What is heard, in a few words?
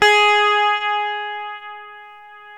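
guitar, Strat, clean electric guitar; Brite Strat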